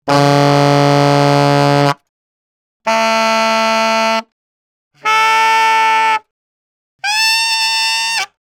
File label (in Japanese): Single Reed, 気鳴楽器; Saxophone